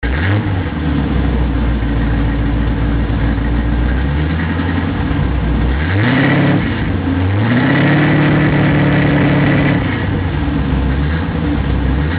super 50 series big block 2 mufflers moderate sound; flowmaster